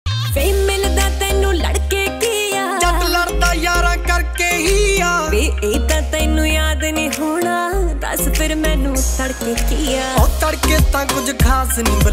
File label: Desi Punjabi Ringtone